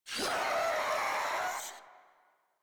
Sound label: Heavy Bark; Enemies; Vocals; Warframe; Proto Infested Ancient